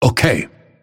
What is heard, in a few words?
move pain; dota; phantom assassin